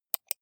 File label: computer mouse right click